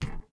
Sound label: fs hMetal, footsteps